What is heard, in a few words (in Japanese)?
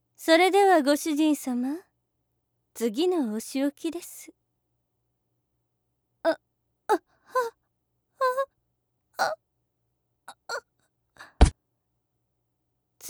故障効果音小